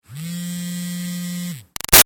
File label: Phone Cell LG Vibrate Buzzy; Cell Phones; Electronics; Big Room Sound